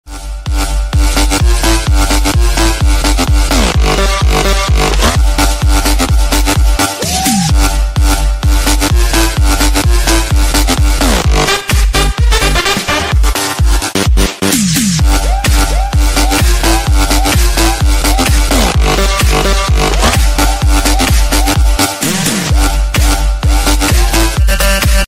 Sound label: crazy trap remix samsung ringtone